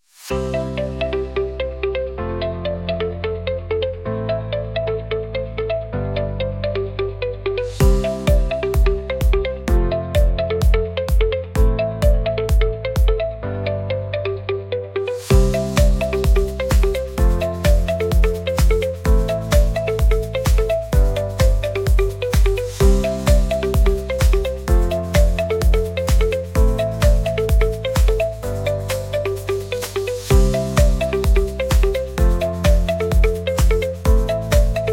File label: Techno Tango